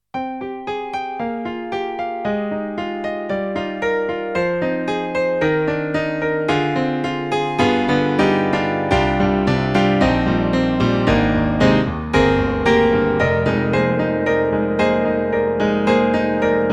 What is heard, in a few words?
KORG N1R